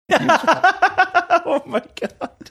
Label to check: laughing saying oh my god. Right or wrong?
Right